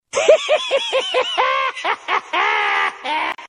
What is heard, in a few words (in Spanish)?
risas; chuky